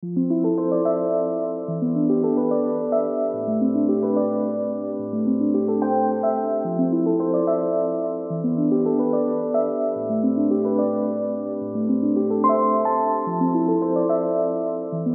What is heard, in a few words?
Piano Loop Am